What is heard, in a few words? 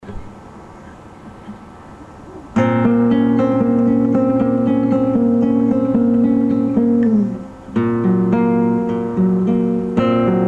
Guitar